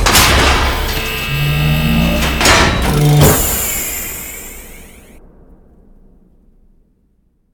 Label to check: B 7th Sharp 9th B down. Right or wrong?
Wrong